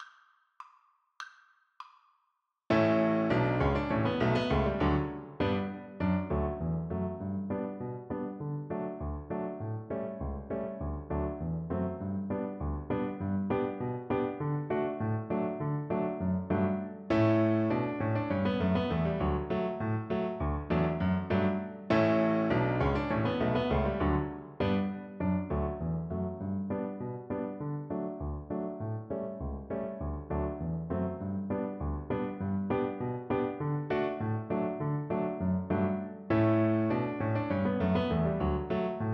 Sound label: Cello; kipera